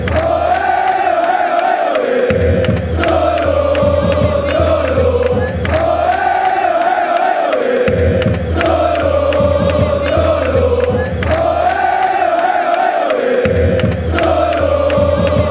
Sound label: cholo cholo, fan chants